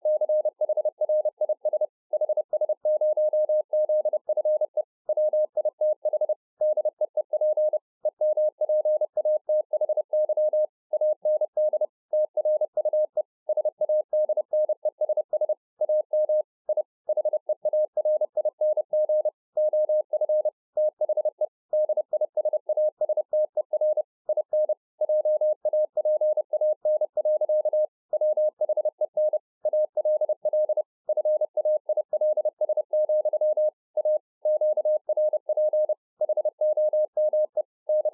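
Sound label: Morse code, 30 WPM; CHRIS HS0ZFE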